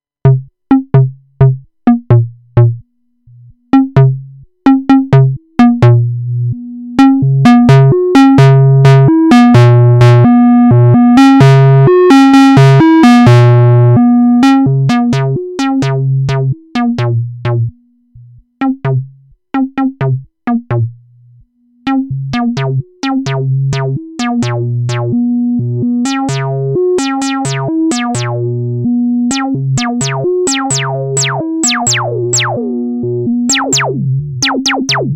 MFB VCF SSI 24dB LP

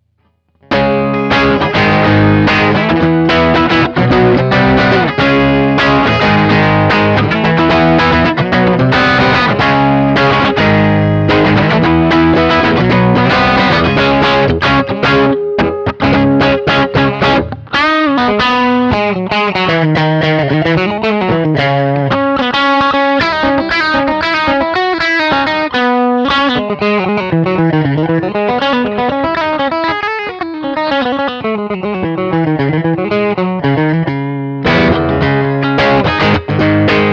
Bridge PU Rock

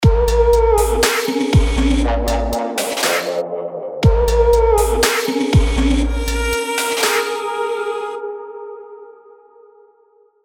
Exhale Trap

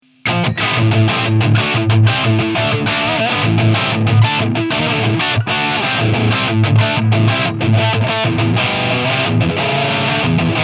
Heavy Crunch Whisky Guitar

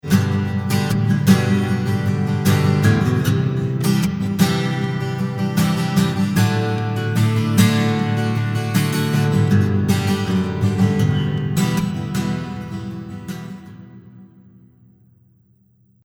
Git 20 sec 50 ms mono

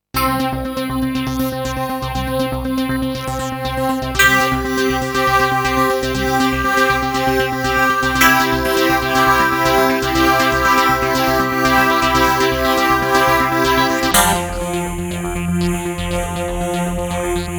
FM Velo Layer; MX61; Together; MOTIF

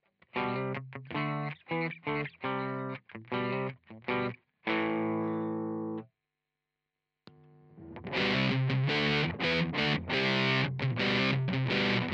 Bridge Kemper Sustain

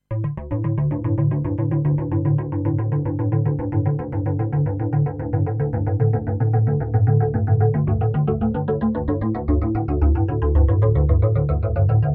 Korg minilogue, Flashback X4, Moog MF103, Arp

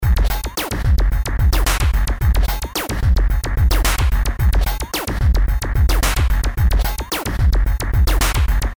Electronic

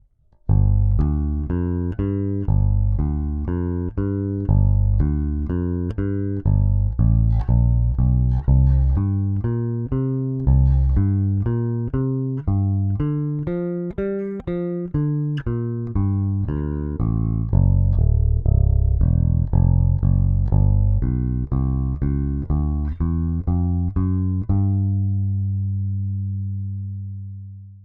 AER AMP One Two Three Low Mid cut

